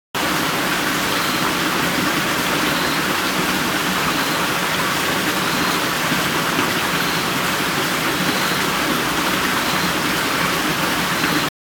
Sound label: water stream large; best service Blue Box; water streams